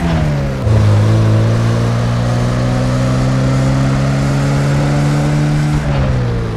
no shift, rx8; vehicles cars; tdmcars

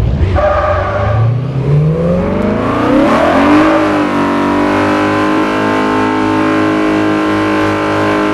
rev, vehicles cars; tdmcars

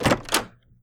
private door open

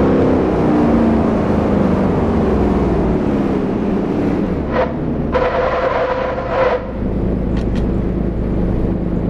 throttle off, vehicles cars charger srt8; tdmcars

